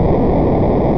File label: engines; Eat My Photons!